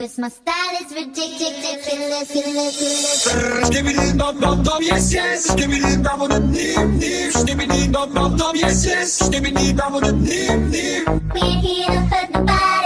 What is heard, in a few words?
Instrumental; Bloxxed Up; Windows